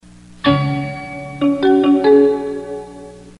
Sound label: Jingle; Intel